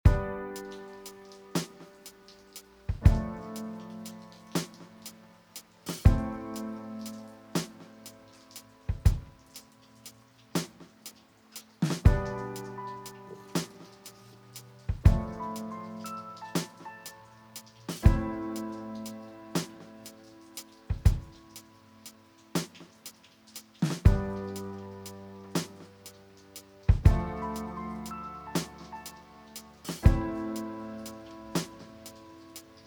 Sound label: Broken Piano